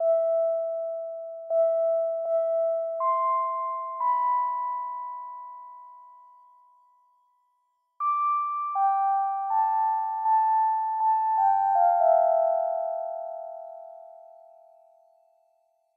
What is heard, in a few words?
DARK BELLS ONLEY BELLS